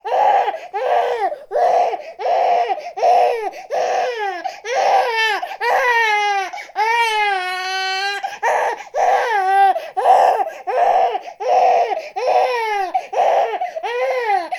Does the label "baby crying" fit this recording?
Yes